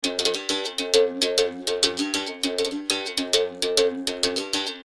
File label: Brazilian Berimbau